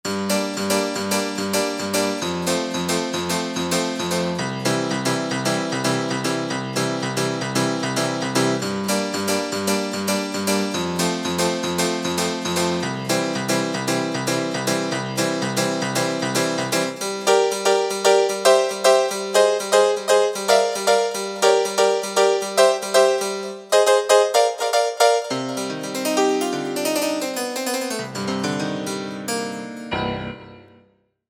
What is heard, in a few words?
Light Pno